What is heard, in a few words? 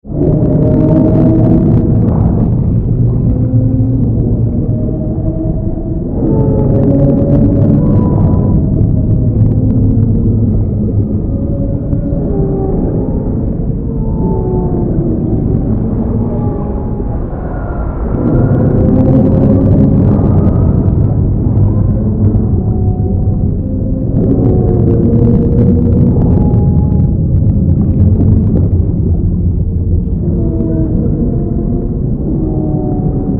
Creature, Sea, Monster, Swarm, Under, Water, Horror; 5.1